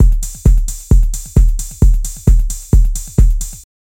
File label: Techno Swing